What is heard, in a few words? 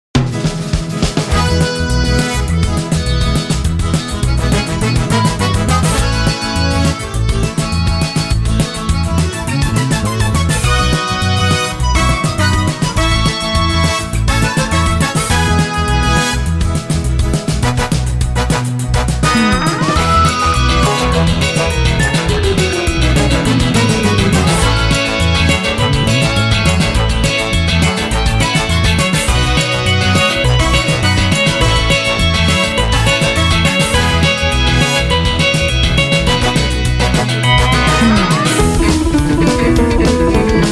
Bluegrass